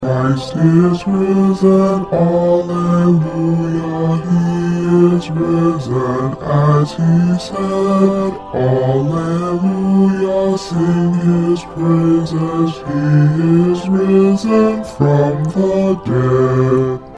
Bass

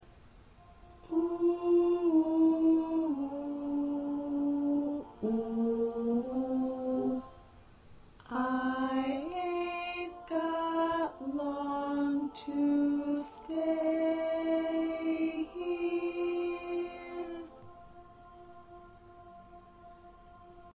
SSAA Soprano 2A

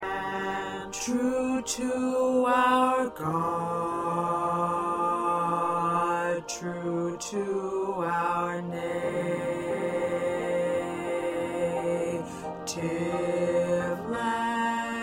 2nd Bass

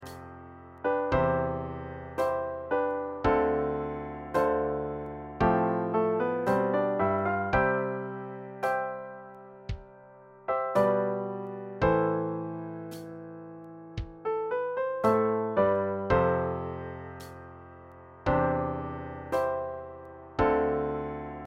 Piano Drum

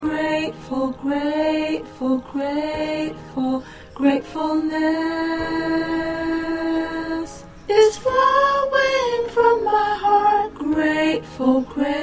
Alto

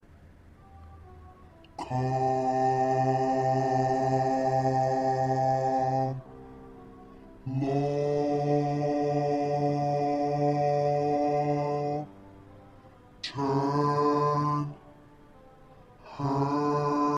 Bass

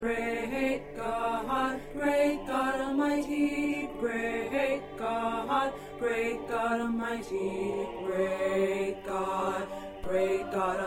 Tenor, choir

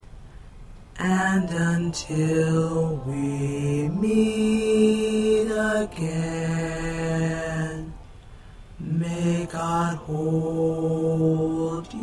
Bass

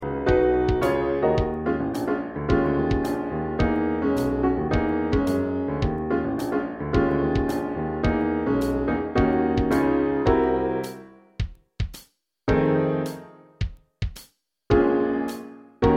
Piano Drum

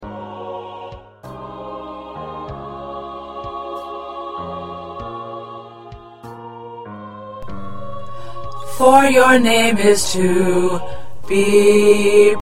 2nd Tenor

